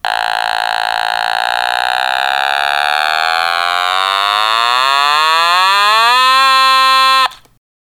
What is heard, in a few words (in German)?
Otamatone tiefe Range